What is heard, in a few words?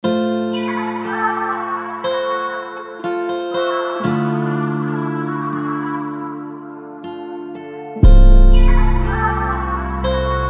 BEAUTIFUL Am 120, trap type beat; Acoustic Guitar, Drum, Heavy Bass, Piano, Strings